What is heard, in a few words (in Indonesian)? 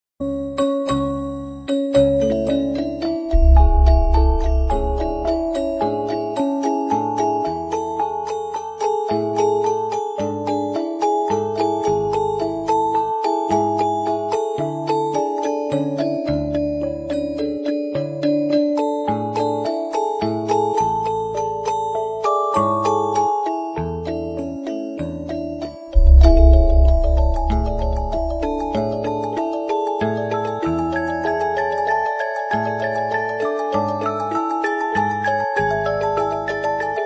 Gamelan Jawa